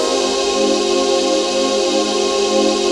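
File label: chord pad, Pads; Best Service; XX Large - Pads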